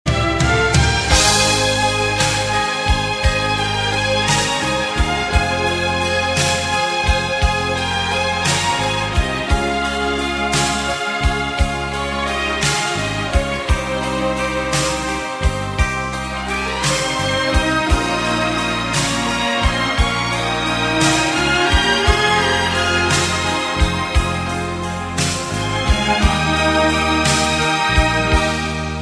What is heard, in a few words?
Iraqi Music